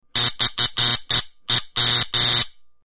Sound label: Solenoid Buzzy Rapi, buzz